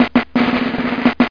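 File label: drum roll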